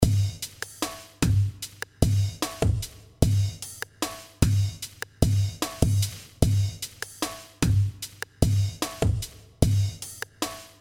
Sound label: Quirky Rhythm